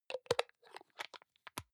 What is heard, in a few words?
hygiene small screw cup close medium, badezimmer